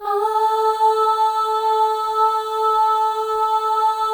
AHS, Symphony of Voices, FEM

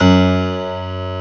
PIANO GB1; St.Piano&Strings

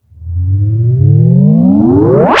Sweeps Up, Effects

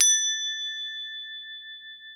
CROTALE A5, Crotales

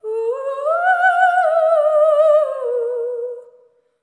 LEGATO, Symphony of Voices; Spectrasonics

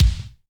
80s Kick

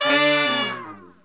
BRS LNG FA, Section FX R&R Falls; Roland L-CDX-03

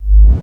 REV BD, Korg Electribe EMX-1

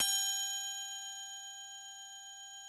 ORIENT G5, ORIENTAL; Music Store; Yamaha VP-1

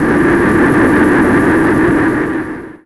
noise, production effects

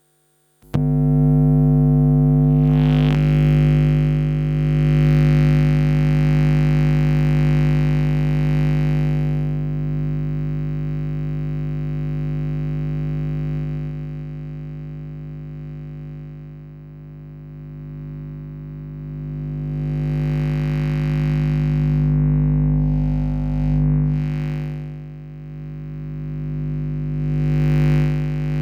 Electromagnetic Field, Electro; electric fields

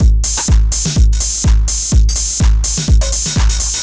drum loop full 125; Sample Magic - Classic Chicago House; Drum Loops

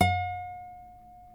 NYLON NO VIB G 5B, Guitars; Spectrasonics; Hans Zimmer Guitars VOL-1

